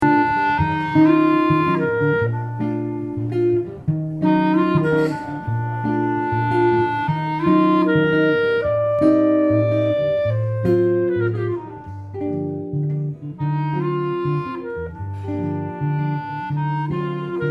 Jazz Duo

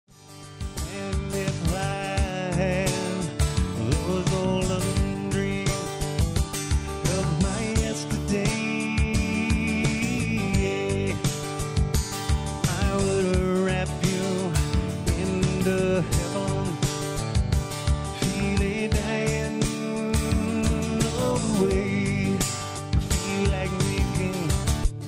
LIVE, Acoustic Guitar

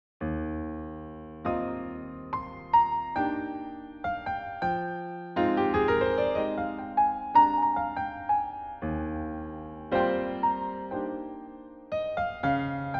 Solo Piano; Jazz

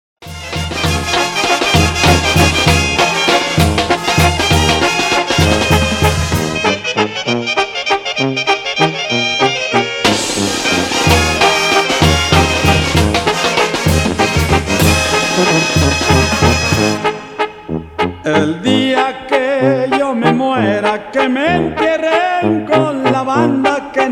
banda mix

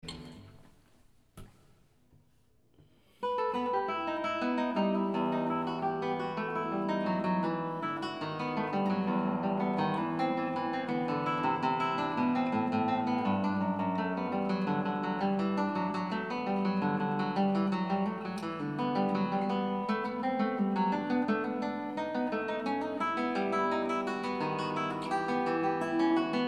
acoustic guitar; Courante by Syvius Leopold Weiss

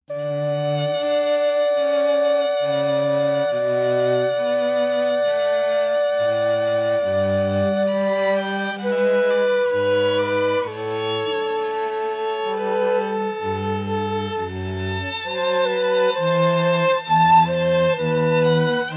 violin flute cello; Air on the G string

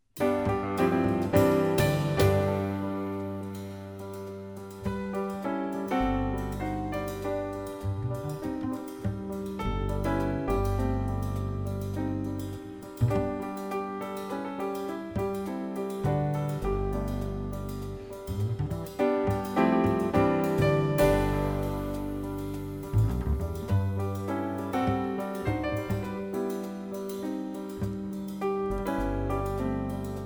New Age Band

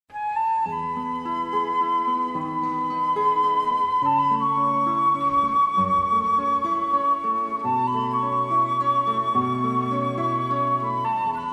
string quartet

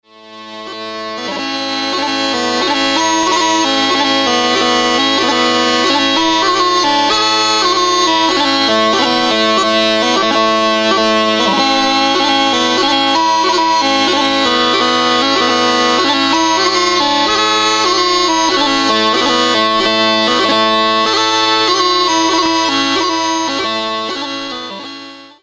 The Wearing of the Green shuttle pipes; bagpipes

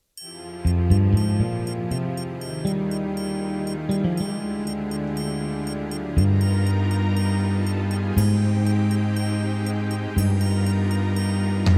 jazz saxophone

